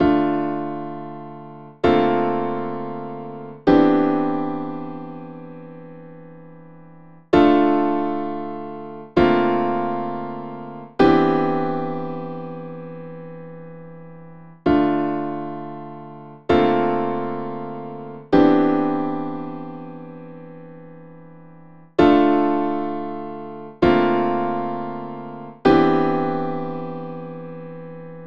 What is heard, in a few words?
Freeze Piano